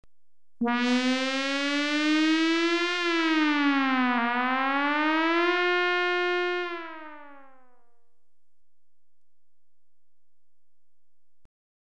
4 Minute Warning Alert (2 Cycles), Electronically Generated Sounds; BBC Sound Effects Library